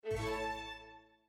Balloon; Sonata

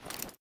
movement cloth; adams venture origins

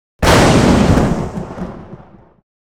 Lightning

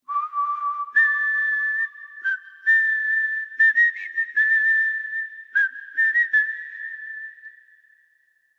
Whistle; Age of Conan Hyborian Adventures; Whistle About Hyborian Adventures

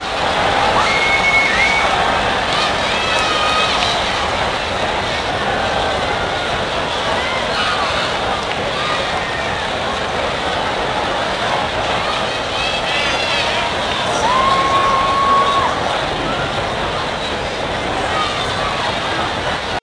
Crowd left

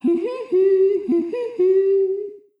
Humming; Hyrule Warriors Definitive Edition; Twili Midna's Humming

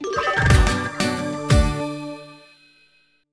Jig; eigo de tabisuru little charo

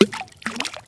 Ball Fall Into Water; pangya fantasy golf pc

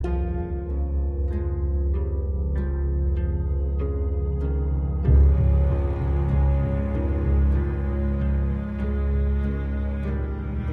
Neutral (Loop)

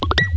combo; Gonner

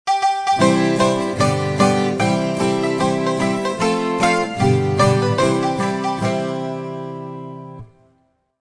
France Music; Civilization Revolution

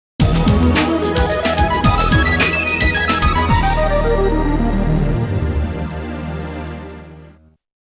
All Clear; Aqua Rush

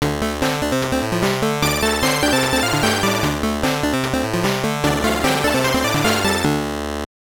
Jingle, gb; Mr. Do!

